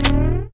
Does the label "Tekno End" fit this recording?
No